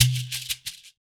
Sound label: Shekere; Spectrasonics - Supreme Beats - World Dance